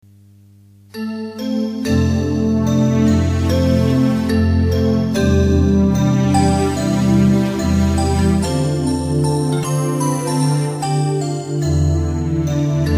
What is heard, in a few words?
synth